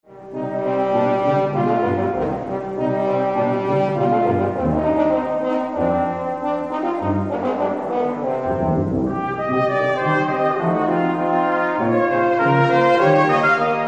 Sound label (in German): Brass